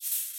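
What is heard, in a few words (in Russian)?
шум горения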